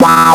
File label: scratch